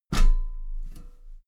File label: step metal ladder step top regular